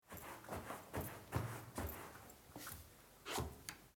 Schuhe abtreten

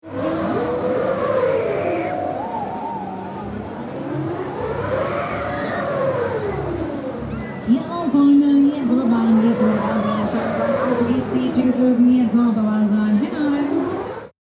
Schaukel

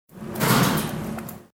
Metallschrank weiter weg

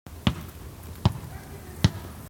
Ball prallen

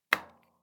Kueche Sounds

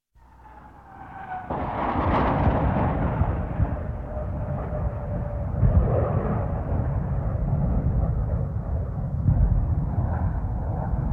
Luftwaffe Starfighter zerstoert Zielrakete mit Sidewinder Missiles

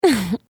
lachen weiblich

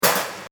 Autowerkstatt hinwerfen

